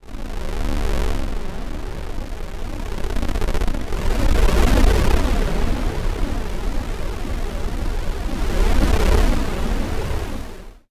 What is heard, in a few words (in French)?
vague